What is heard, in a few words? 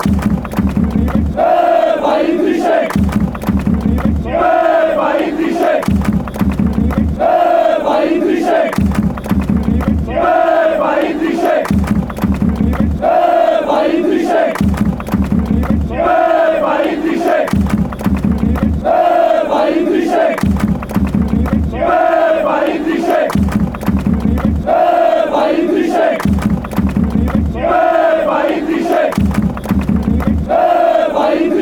chant, fanchants; bohemians